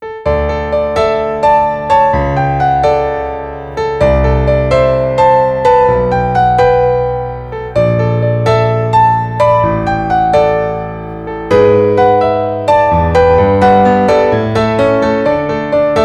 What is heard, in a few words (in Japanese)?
piano ver.; AIKISS Original Soundtrack After School Natural Makeup